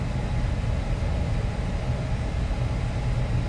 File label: air conditioner; Golden Crayon Sounds